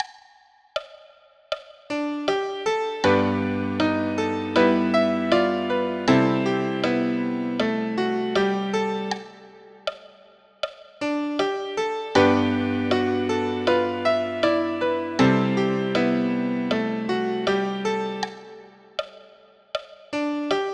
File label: Danny Boy, Line cliches, major